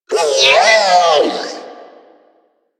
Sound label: Repair Drone Death; Warframe; Necramech Repair Drone